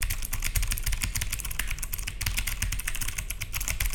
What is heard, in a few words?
Teal Switch